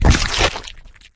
infector bite; flood

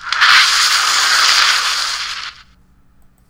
spell

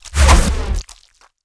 dragon tail

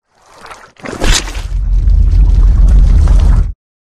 unarmed melee suicide; flood; carrier; predatorcz; halo

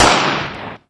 shoot; lugen

